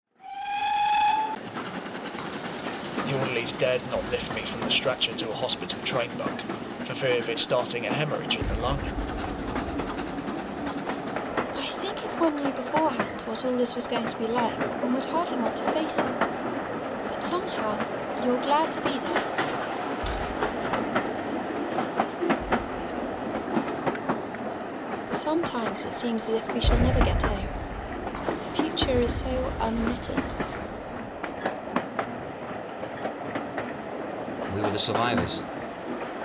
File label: Ambulance trains Binaural